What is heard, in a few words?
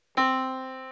Yamaha Disk, piano sound